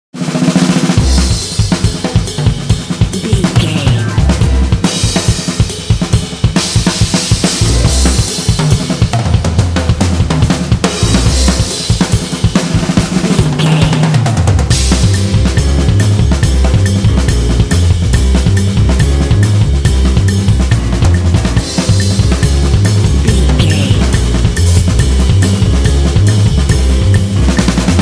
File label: latino punch